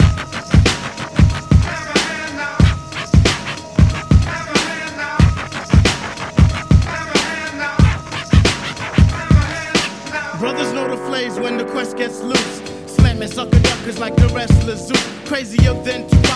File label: clap open